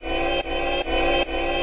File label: Shield Loop; Smashy Road Arena android ios 2016